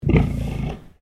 Animal Pig Landrace Inside Grunt

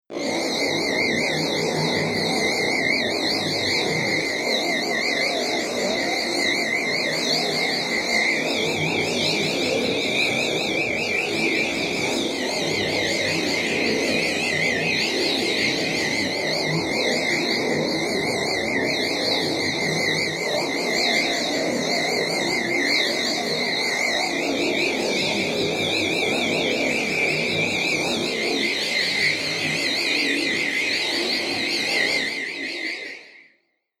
Paranormal Ghost Motion; Ghost Sound Effects; horror sound effects

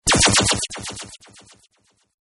laser blast; Partners In Rhyme

